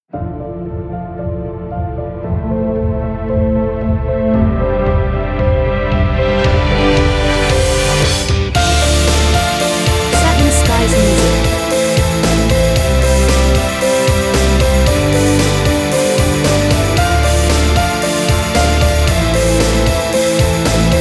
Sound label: Epic Success Story